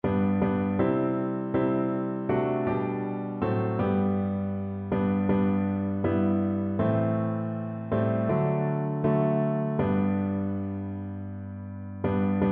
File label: PNO